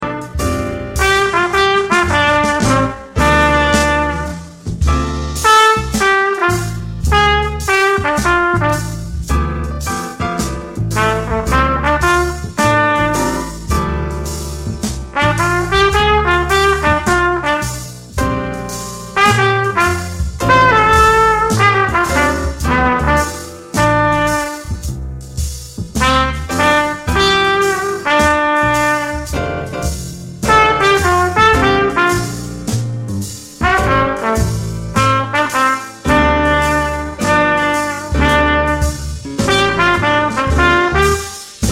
Play-a-long, Funky, with Bkgds, qn=108

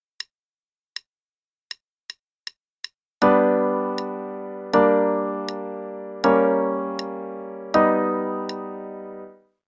Chords on G; CM Level 2

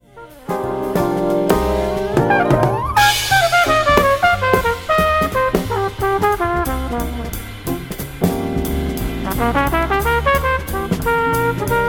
trpt solo only, jazz trumpet

